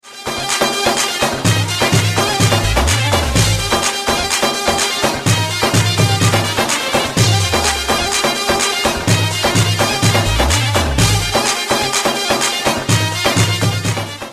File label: Dabkeh